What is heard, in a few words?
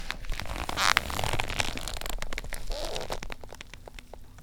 Vine Stretch